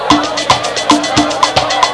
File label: CARIBBEA